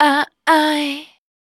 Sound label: VOC F, Dance Vocals, FEMALE